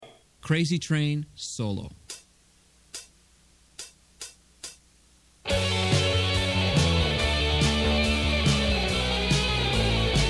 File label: solo